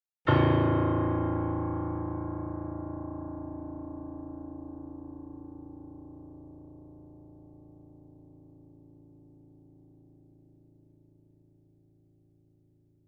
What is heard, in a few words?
A0 Grand Piano; KORG; Sound Sampling Collection VOL-3; Grand Piano 88